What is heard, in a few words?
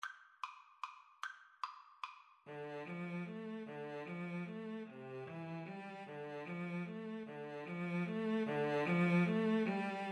scarborough fair, Violin Cello Duet